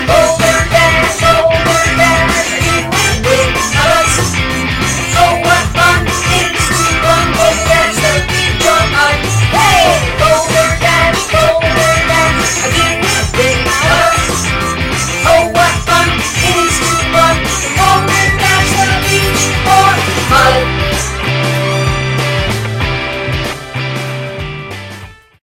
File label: Jingle Bells; BoldrDash